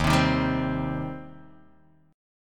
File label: Eb 6th Eb down Guitar DADGAD